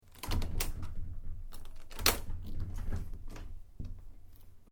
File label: Door Factory Metal Sliding Lock, industrial doors; SoundEffects Foundation2